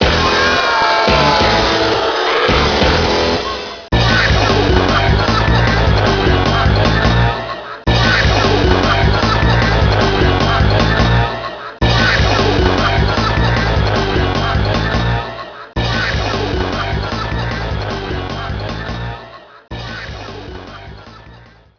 Minigame Lose; Rayman Raving Rabbids 2; nintendo ds

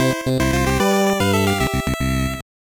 Jingle, soundtrack; Momotarou Dengeki 2; SGB

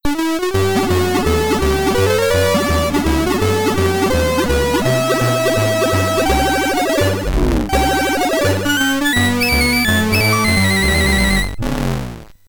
Task Completed

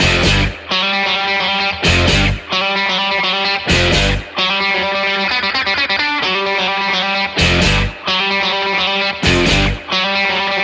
Loop; Fried Chicken Gobblers

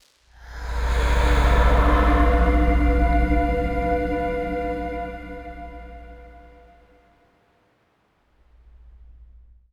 74 BPM; MetebelisIVEnd

